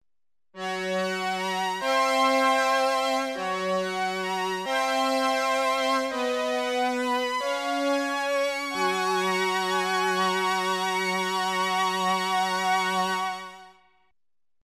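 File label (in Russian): синтезатор симфоническая заставка с фанфарами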